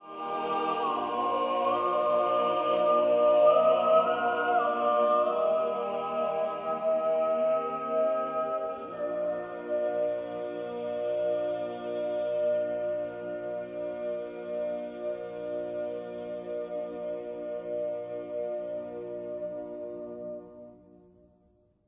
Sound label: cadences